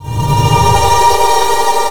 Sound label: ANGEL VOX L; Big Beat Samples & More; AKAI; VOX FX1 KIT